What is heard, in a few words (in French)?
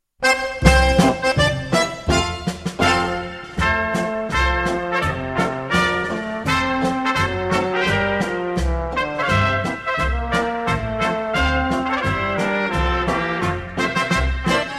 Kuckuckspolka, Autriche